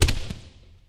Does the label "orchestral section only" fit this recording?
No